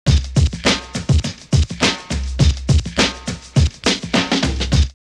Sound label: Breath Loop 103, drumloops OldSchool; X-Static Goldmine